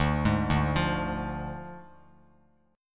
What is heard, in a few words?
D Diminished D, Bass Standard; arpeggiated